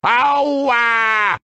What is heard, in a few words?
oww wah; wario